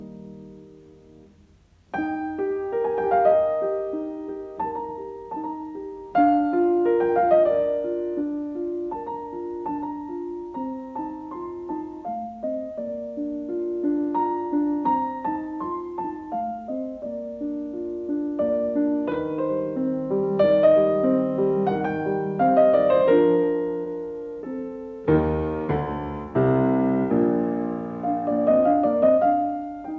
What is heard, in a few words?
Piano Sonata No 3 in B flat major K 281 II Andante amoroso; Lili Kraus